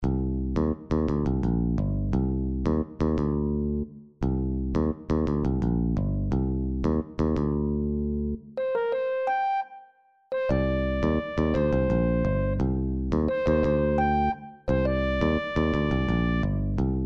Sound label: jazz